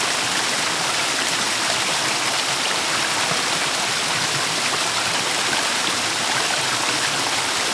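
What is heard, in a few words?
ambience waterfall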